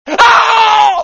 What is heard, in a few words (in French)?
vocale aaargh